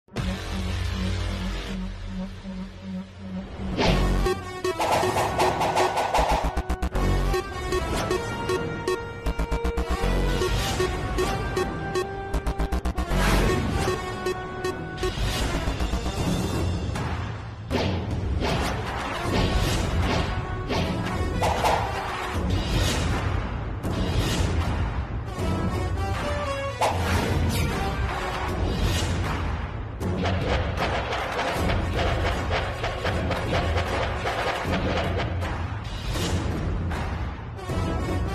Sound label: background music theme song; kayamat; madam sir